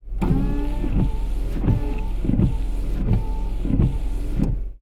Wipers

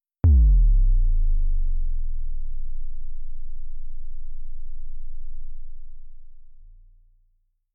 Bass Drop

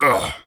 Pain

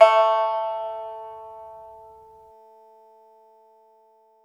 Pipa A4

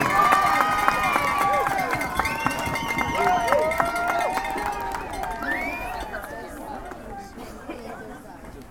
Crowd Cheer

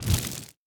Fire Ball

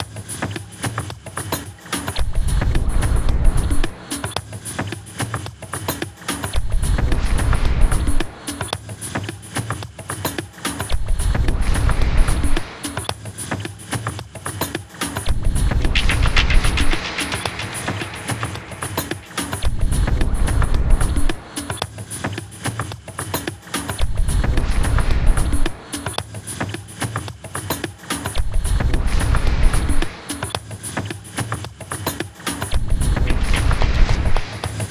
Loop 110bpm

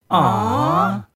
Awwwww

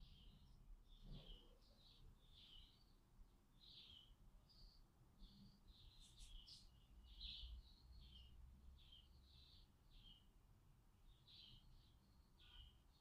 Garden Ambience

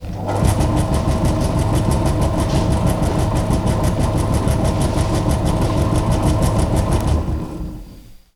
Ceramics Wheel

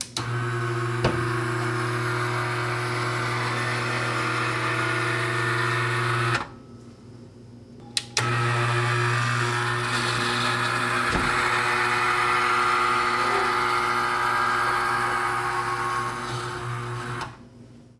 Machine Long

